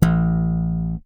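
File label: Yamaha thump, Bass; Masterbits CLIMAX 7; PATITUCCI BS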